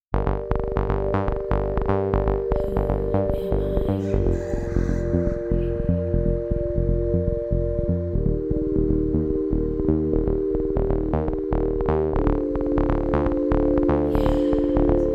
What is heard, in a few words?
Ocarina Pad